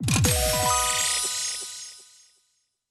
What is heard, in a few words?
win excellent; riviera Star; Fantasma